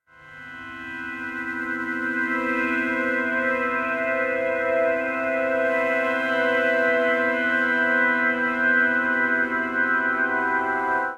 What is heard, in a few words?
Drone C 80 Bpm; MIDI Clips; Instruments; Voyager; Ableton; Drone Lab